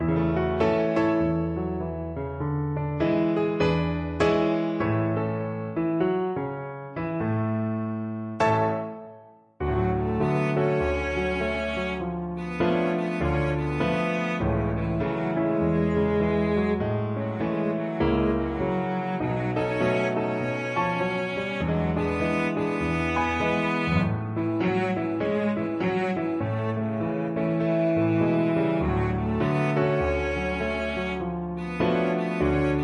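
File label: violoncello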